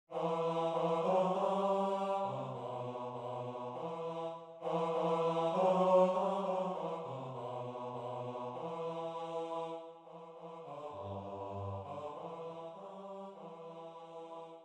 crown of roses bass, choir

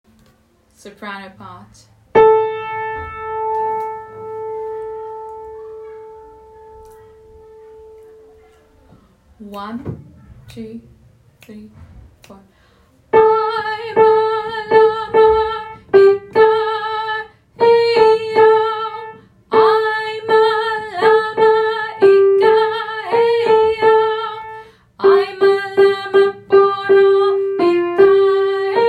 sop only

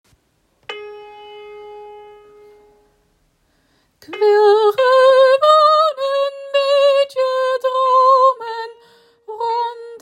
dutch song soprano part second verse

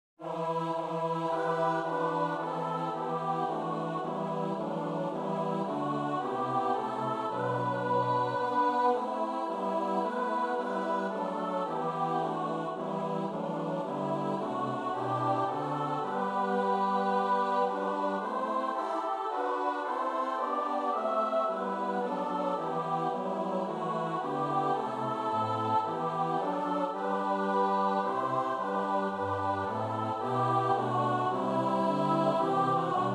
ssatb organ

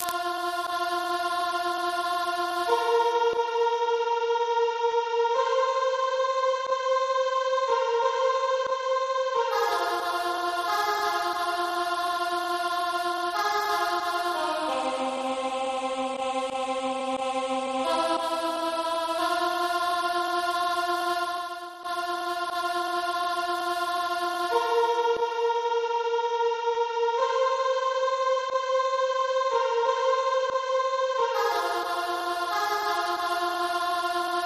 go down moses sop, choir

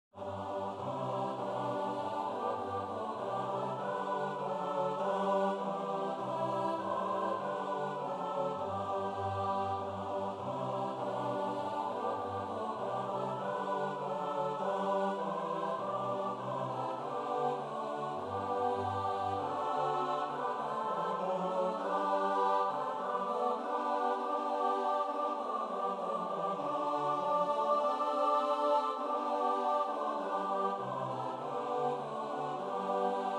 guide me o satb

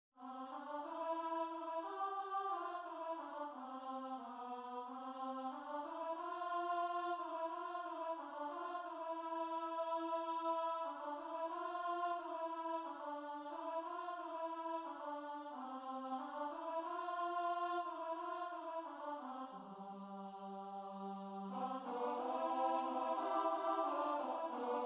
satb

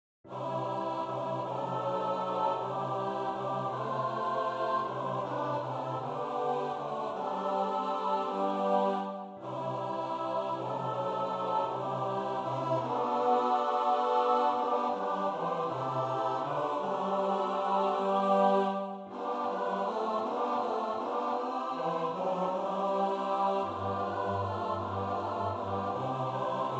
satb piano

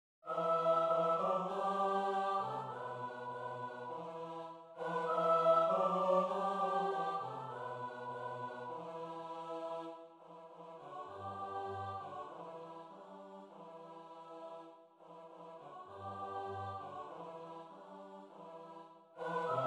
crown of roses sops, choir

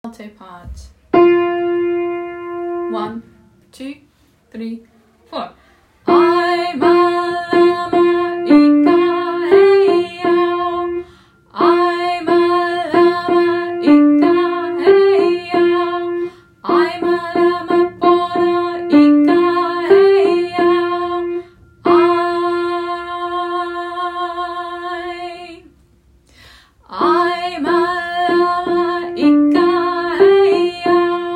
alto only

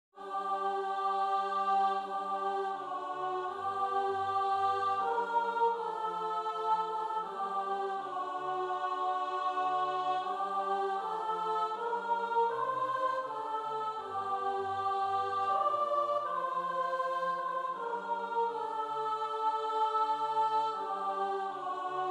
sops altos; coventry carol

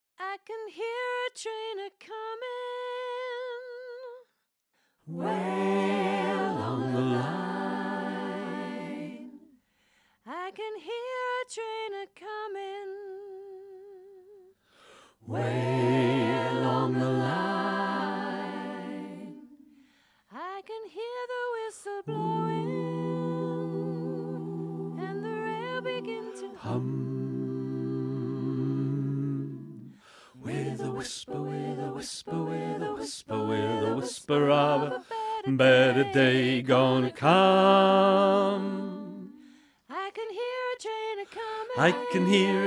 bass learning track

